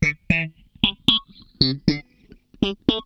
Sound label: FAZE PLUK, Funk Guitar; 90 s Sample CDs; Zero G